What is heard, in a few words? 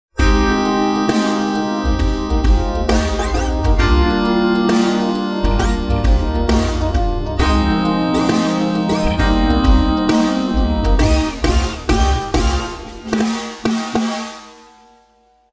Pop; TSMtoolbox